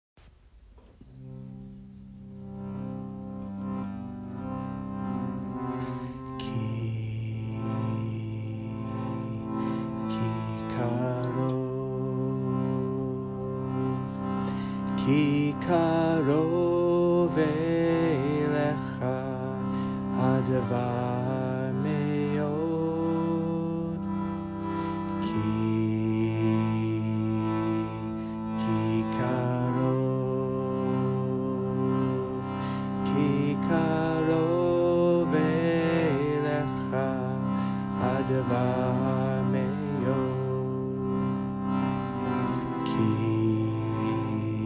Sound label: chant; ki karov